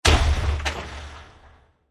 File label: Impact Blast With Dropping Elements; Blasts; Impacts; Crafted Signals